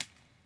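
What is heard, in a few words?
wet walk left, footsteps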